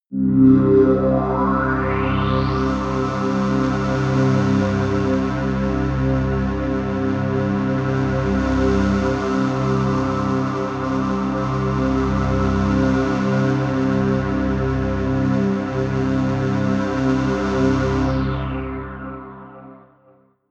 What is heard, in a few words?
pad sweep falter; flaechen